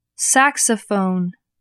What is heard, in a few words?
saxophone